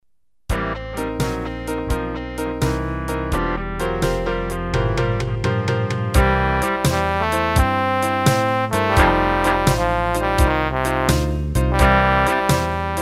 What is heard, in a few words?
Mada blues